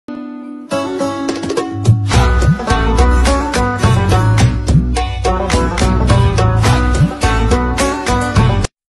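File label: background music ringtone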